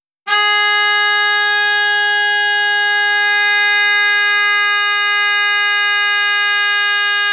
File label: stereo, replacement horns